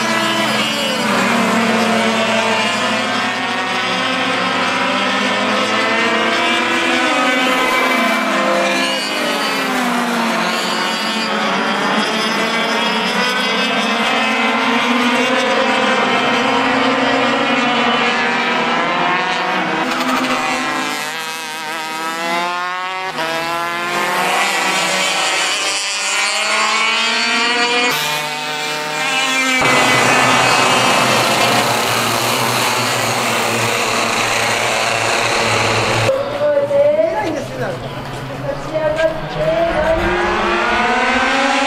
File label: kart; RACING-KART; motegi